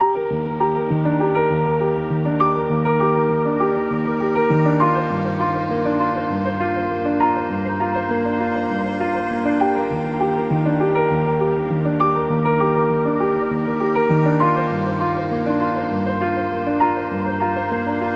Inspiration Emotional; self motivation

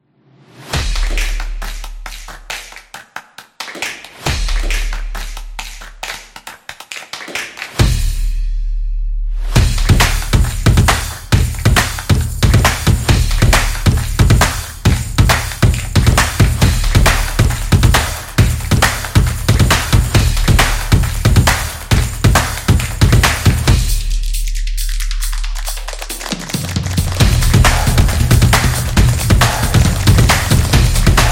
Fun Energetic

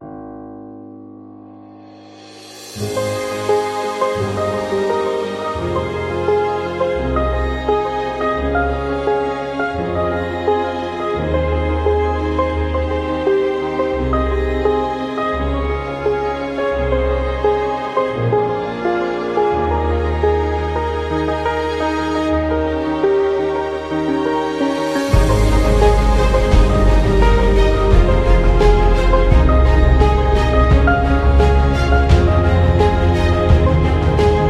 Inspiring Piano; planetarium

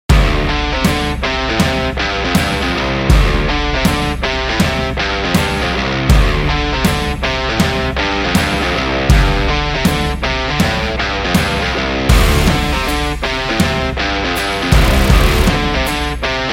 Rock Style Trailer

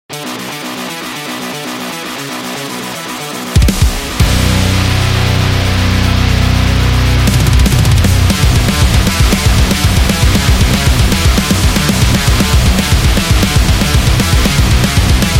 This Is The Metal

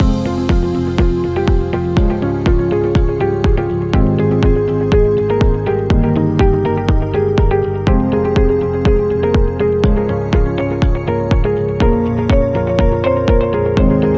Warm Loop

